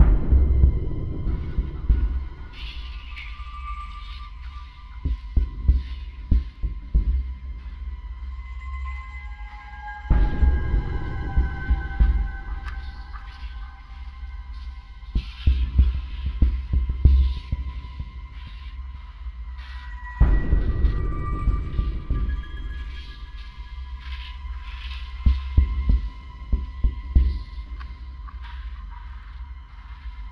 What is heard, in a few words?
Puzzle Loop